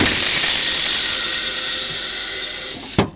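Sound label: Emergency Brake, Train; OpenBVE; Bzmot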